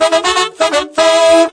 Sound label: mariachi horns; Horns and Brass